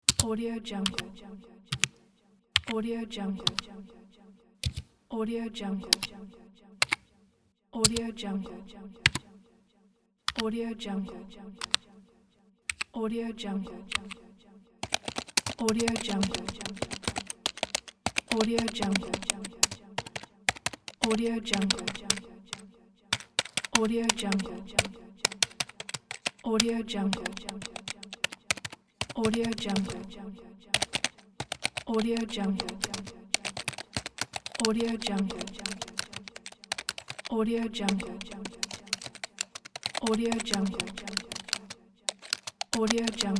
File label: keyboard typing pack